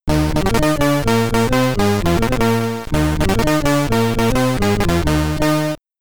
Main Menu (SID Stereo); Kings of the Beach; Commodore 64

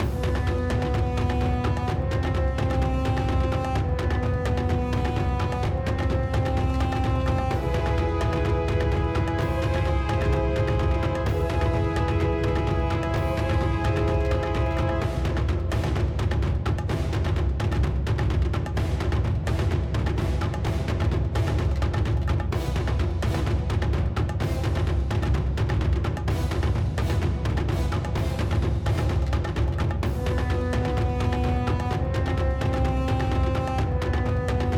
Combat Arena (Loop); Avatar The Last Airbender The Burning Earth; ps2 wii xbox 360

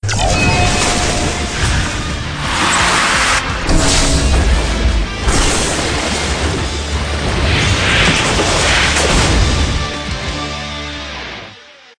Battle Music; Zak Storm Super Pirate; mobile; Battle Beru Music

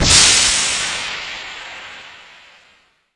smoke grenade

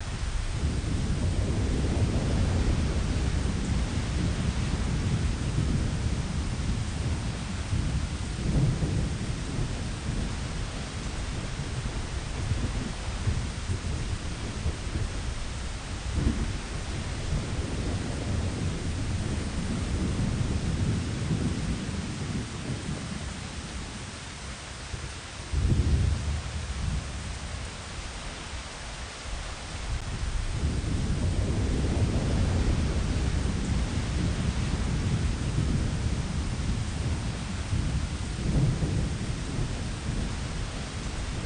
Thunderstorm; tokyo jungle; ps3

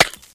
Ice Light Collision; angry birds

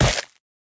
Squished Crush; Boomerang Fu

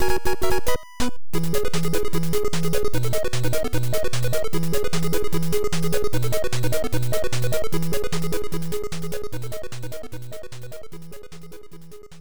Start Game; Nintendo World Championships 1990; NES

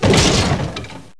break wood

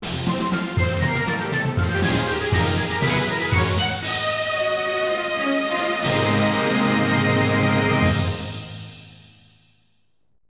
Jingle Battle Win

